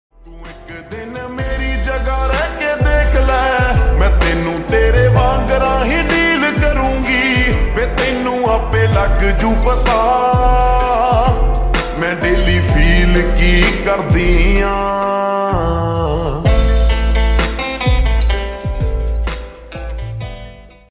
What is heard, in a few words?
Slowed Reverb Ringtone